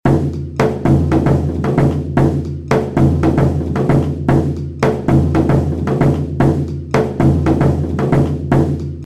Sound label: alfaia